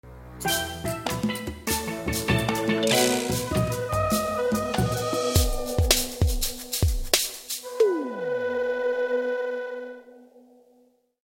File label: Monkey Combat - Winner